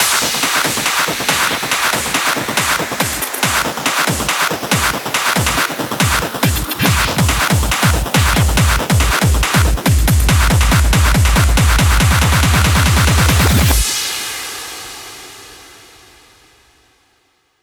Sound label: 140BPM Rebound 8Bars, Hands Up Trance Fills; Vengeance Samples